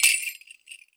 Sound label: skranz, WORLD PERCUSSION; Best Service ProSamples vol.52 - World Instruments 2; MIXED PERCUSSION